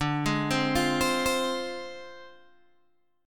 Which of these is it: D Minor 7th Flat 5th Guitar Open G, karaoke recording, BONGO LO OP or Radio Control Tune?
D Minor 7th Flat 5th Guitar Open G